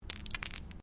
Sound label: Dice Small Pair Shake Hands